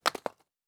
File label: Rock Impact; Survival Game Sounds - Lite Edition; Environment